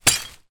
impact glass x2 broken bottles drop in plastic bag; zapsplat